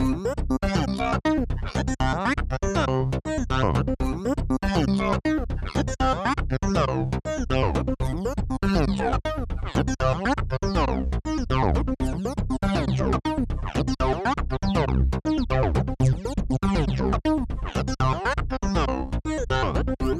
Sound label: humonica sweeps